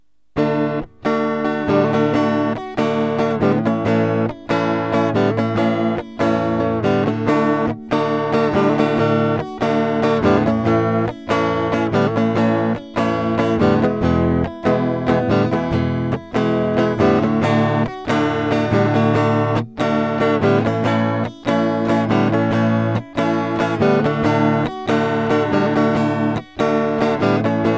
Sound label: tele neck alnico